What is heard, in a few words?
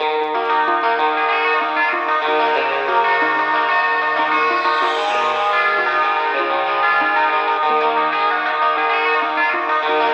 guitar, Sad